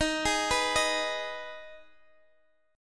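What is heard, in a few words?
E flat Suspended 4th Sharp 5th, chord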